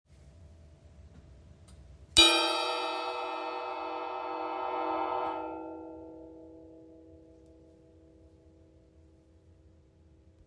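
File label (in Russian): удар по колоколу тарелке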